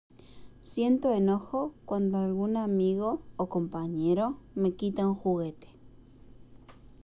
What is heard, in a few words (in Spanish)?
enojo